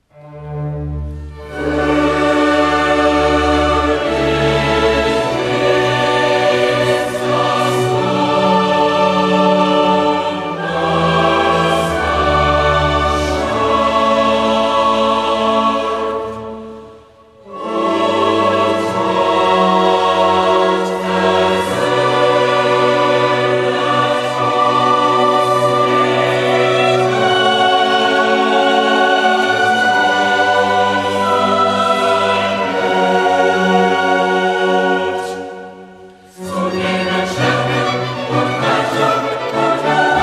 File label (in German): Coro Chor; Haendel Messias; Wuerdig ist das Lamm